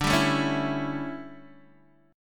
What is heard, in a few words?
D Major 7th Suspended 2nd Suspended 4th D, Guitar, DADGAD